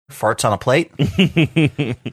farts on a plate

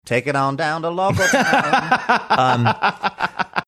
singing

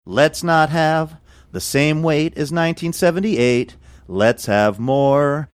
singing let's not have same weight as

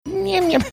weird sound

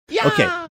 doing softer version scream; walshelm scream

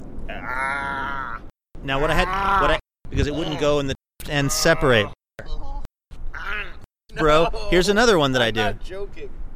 disgusted groans

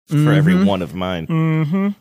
mmm hmm

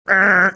making a car horn honking sound

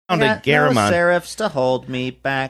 singing no serifs to hold me back

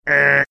making buzzer sound

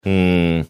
deflated mmm

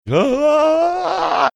aaaaaaaaah

